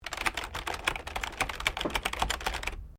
Keyboard Computer Sequence Long, Computer keyboard typing input; Electronics